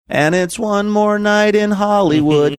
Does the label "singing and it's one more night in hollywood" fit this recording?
Yes